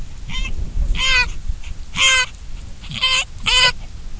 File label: Procapra gutturosa female call; Female Mongolian gazelle contact calls are reminiscent of cat meows